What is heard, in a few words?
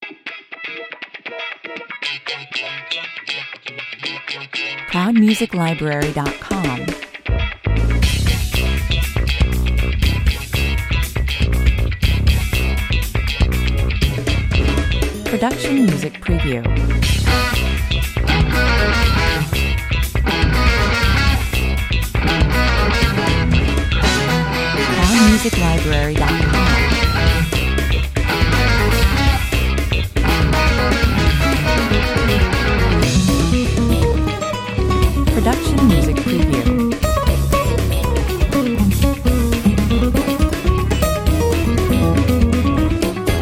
fusion 120 bpm